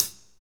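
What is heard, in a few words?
hi-hat, Techno Rock; Northstar; Drumscapes Roland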